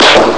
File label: shot; ST-XX Amiga Soundtracker Sample Packs